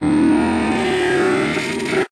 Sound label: Music Devourer Crack